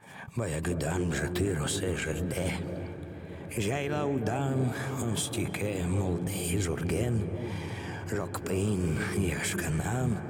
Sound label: Step